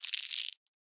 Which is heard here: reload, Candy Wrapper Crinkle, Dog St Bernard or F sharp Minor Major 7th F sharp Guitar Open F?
Candy Wrapper Crinkle